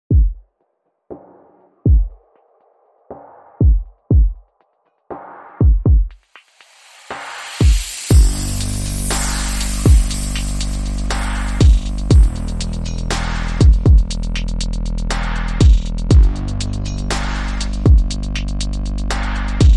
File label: Drums Bass and SFX Only